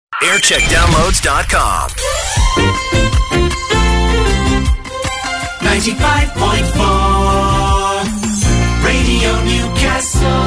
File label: Jingle Montage; Radio Newcastle; JAM